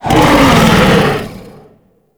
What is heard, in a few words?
Infested Bone Blade Vocal Soft B; Warframe